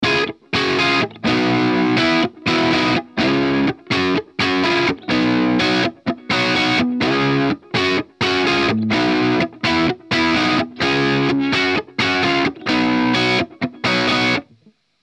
CRUNCH

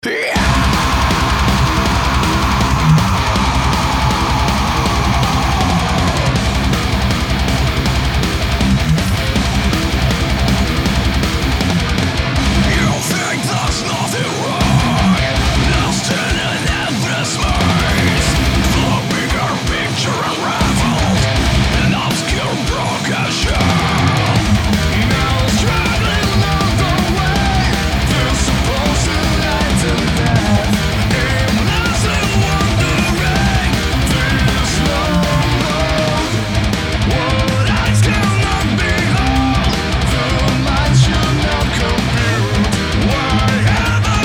MIX REAL

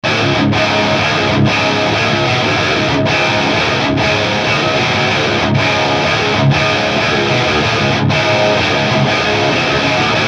GTR

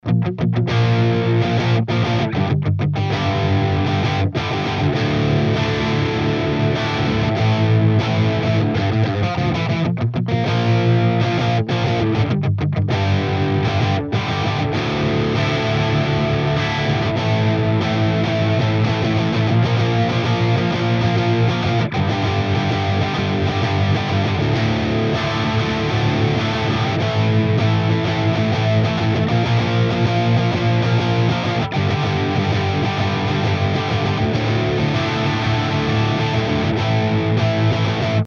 axe guit

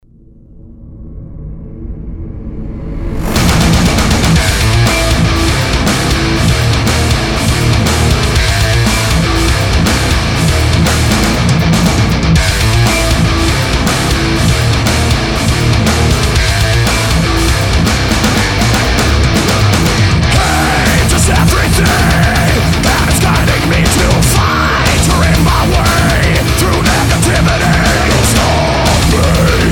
MESA MARSHALL MIX

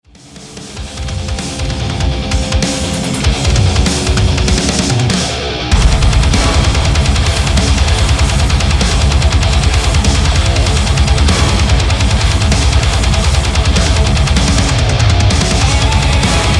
RECTO V30

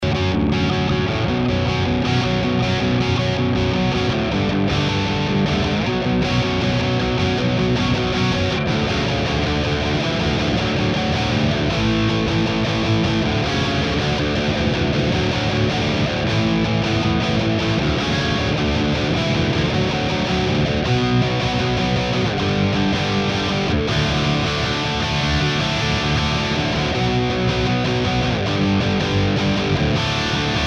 SINAMP SOLO

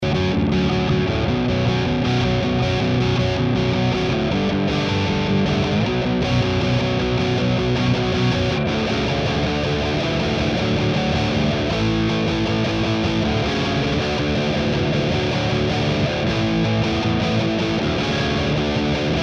SOLO

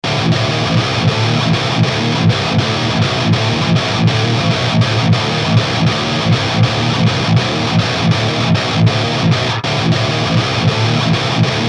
sinamp git

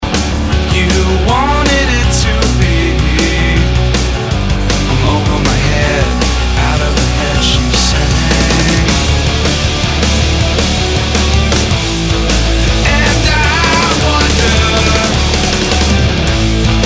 CRUNCH

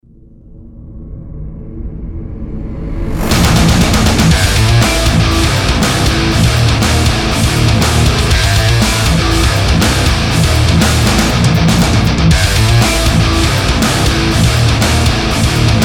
MESA MESA MIX

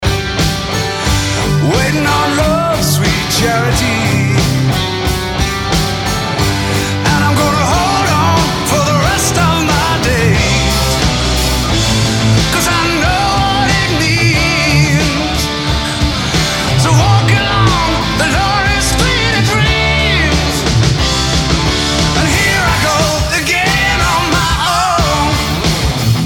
MIX AXE GREENBACK, jcm800 4 speakers

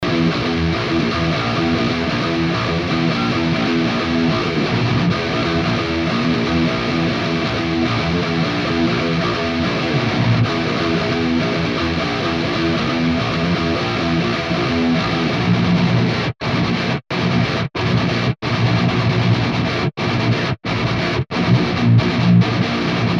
guit; axe

